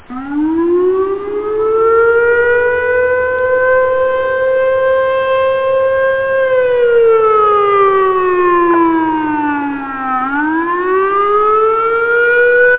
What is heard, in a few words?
Siren; da capo vol1